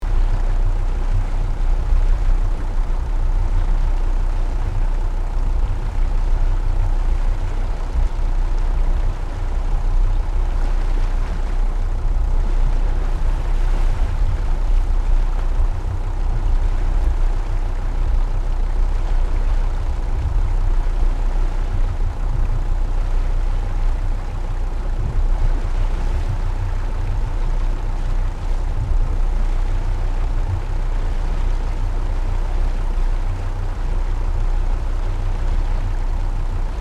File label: Motorboat whirring idle gentle sea waves loopable